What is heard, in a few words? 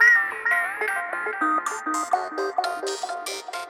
synth Glitch Rhythm 100bpm G